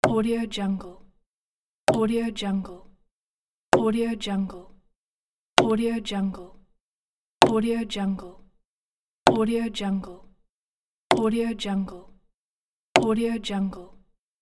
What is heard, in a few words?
hit on the wooden surface